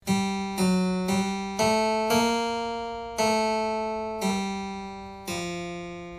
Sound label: harp